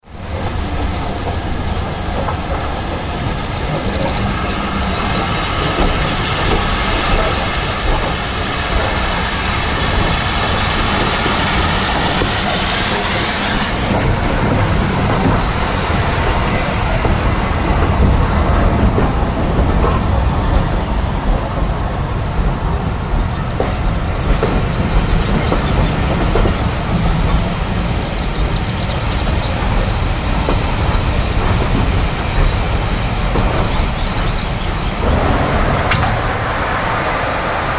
Steam Train, Steam train pulling into station and later moving off.; Vehicles